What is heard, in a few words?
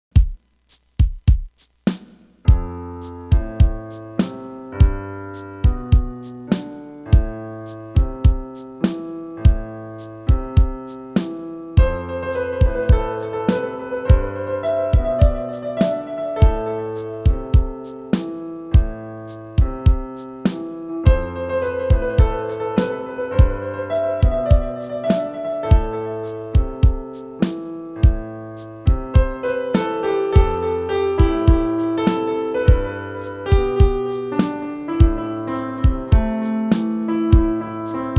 piano; oktalyzer2